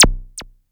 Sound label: blip, Korg ER-1; 300 Drum Machines; Kit 4